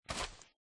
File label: walk, turtle; mob; Minecraft